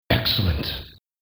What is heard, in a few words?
coin